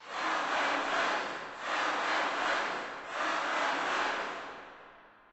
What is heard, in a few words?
lobby victory crowd; Smite; Cabrakan